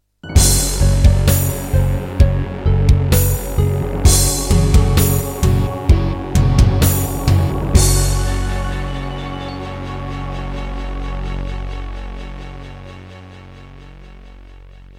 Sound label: Introduction, Serdaco Yucatan FX (Turtle Beach Cancun FX Clone); Doom (Sigil)